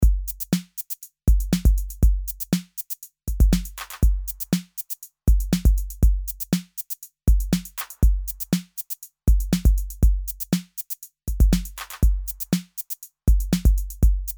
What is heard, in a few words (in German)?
drumloop unbearbeitet; softube weiss gambit for console 1 demo